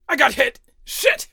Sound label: wound; darkwing